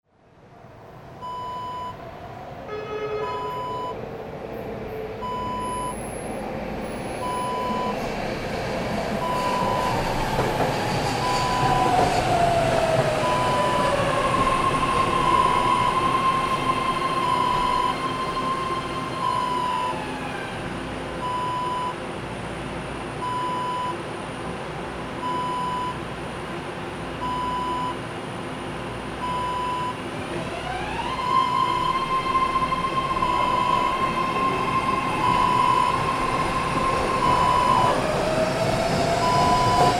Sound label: subway train arrive and depart